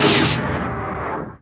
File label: blast; Shadow Man